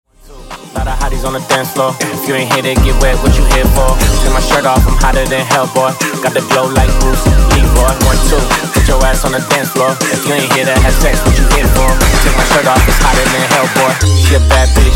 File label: Funk Intro Outro 120Bpm